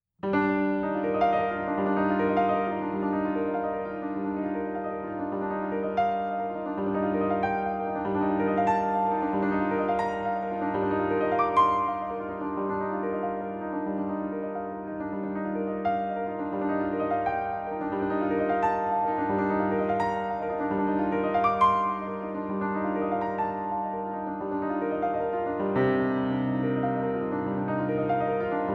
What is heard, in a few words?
Nocturne G Minor